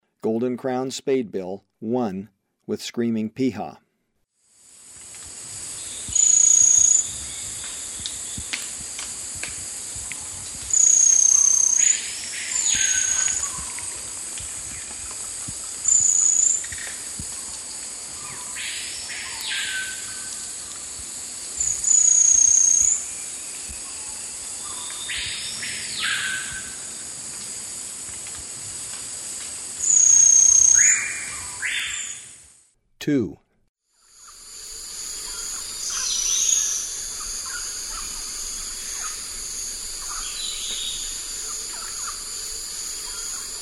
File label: Golden-crowned Spadebill; S. America; Eastern Lowlands